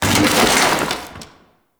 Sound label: Chair Destroy Misc; Warframe; Entrati; Ambience